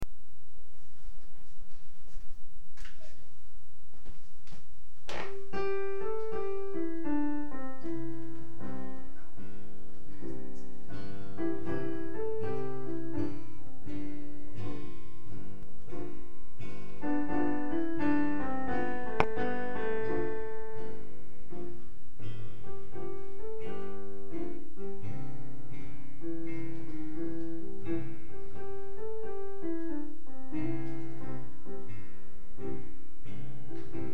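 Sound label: piano guitar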